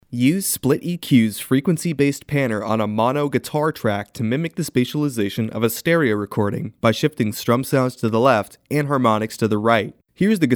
Widen Guitar; ギター; SplitEQ

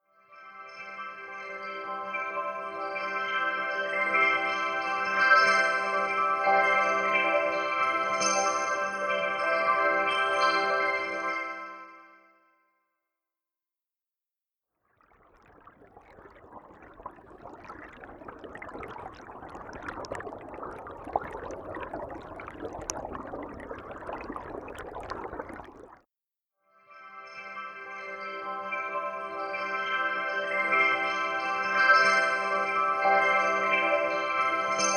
Water Creek Steel Drums; Don’t Steel Drums; Temperance Pro

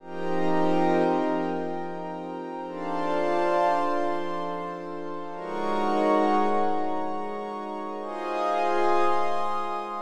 Synth Pad Fx Off

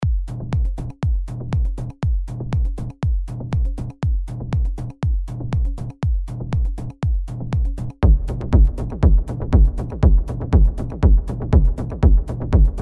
drum

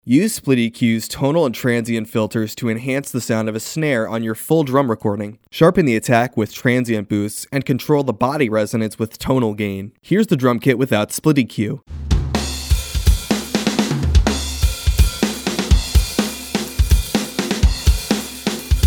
Enhance Drums; エンハンス; ドラム; SplitEQ

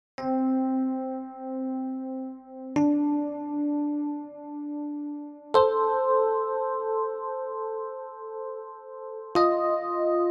A Mouse Sized Orchestra; Music Mouse

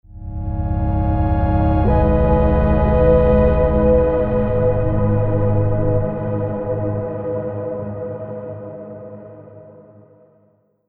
Space Reverse, ポリフォニックシンセサイザー; Preset: 2001 Space Reverse; Generate; Newfangled Audio

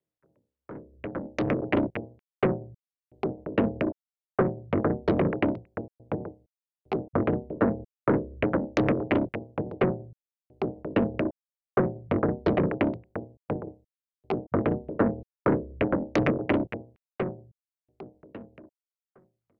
off Chorus solo

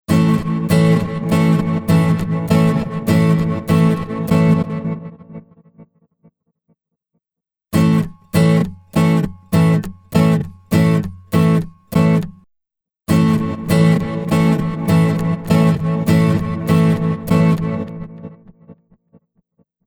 Acoustic Guitar Seasaw Micropitch; Preset: Seasaw MicroPitch

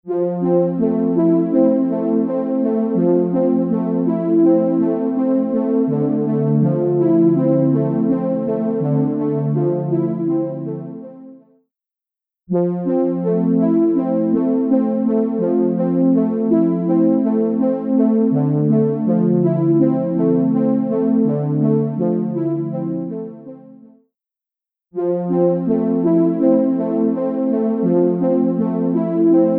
Synth Poly Choir; Tverb; Preset: Choir at Hansa

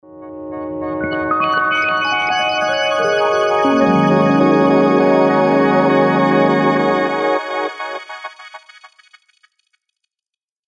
Keys, Piano; Preset: Classic Crystals

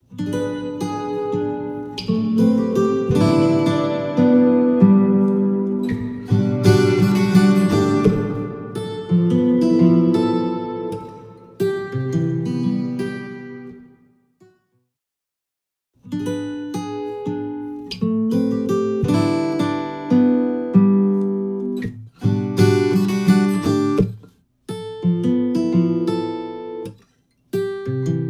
Acoustic Guitar Recital Hall Binaural; バイノーラル音源のサンプルは、7.1.2ch環境でミキシングされ、Dolby Atmos®バイノーラル・レンダラーを用いてレンダリングされています; Blackhole Immersive

